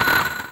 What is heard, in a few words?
perc hi crunch